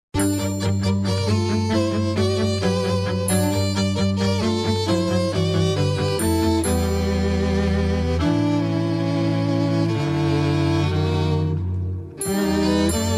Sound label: Country & Eastern Music